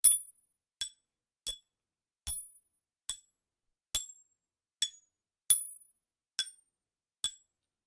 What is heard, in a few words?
shell casings rifle